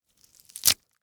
Tear Rip Green Pepper, Texture, Tear, Rip, Green, Pepper, Skin, Vegetable; Ripping